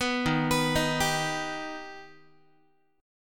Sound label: Eb Augmented Eb, Guitar; arpeggiated; JGuitar